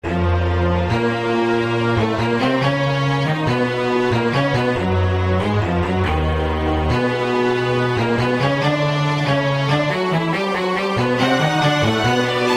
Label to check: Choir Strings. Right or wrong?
Right